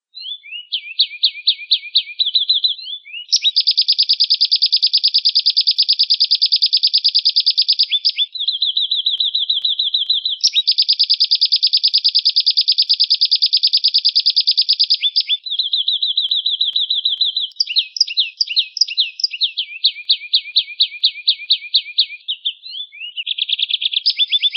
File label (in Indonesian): melatih lb ngekek panjang